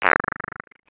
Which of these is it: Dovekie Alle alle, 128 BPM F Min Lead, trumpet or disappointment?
disappointment